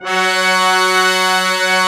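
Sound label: Brass Ensemble mute; Roland L-CD702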